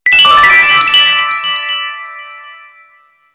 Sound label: notification Diplex; Maximus; iCube